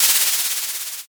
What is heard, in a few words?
scroll open